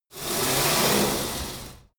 pullup machine cable release fast, fitnessstudio

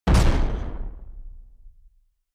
sci-fi gun mechanic fire soft

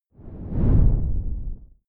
whoosh simple low short grainy

